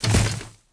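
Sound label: droid body fall large dirt; droids robots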